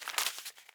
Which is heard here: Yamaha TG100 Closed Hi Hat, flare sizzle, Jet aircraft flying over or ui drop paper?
ui drop paper